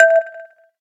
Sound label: electric zero, keytone; SKY IM-A730S-VEGA-S